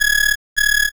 black phone

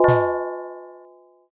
prison door

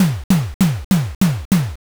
rush

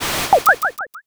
gacha roll

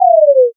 debuff

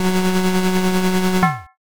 crane game

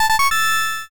short fanfare

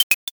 crack

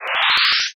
fusuma

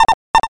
frog

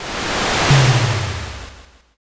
fire magic

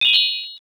tambourine

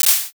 clap

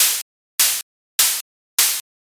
leaf step

